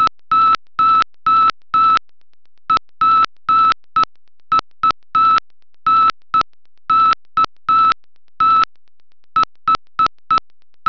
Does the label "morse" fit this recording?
Yes